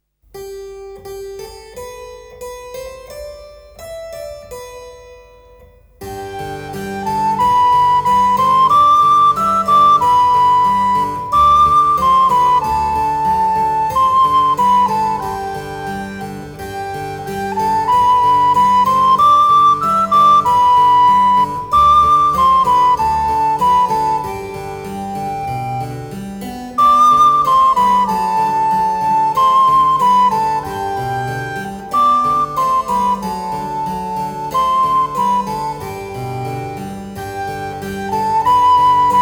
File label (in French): Flûte et clavecin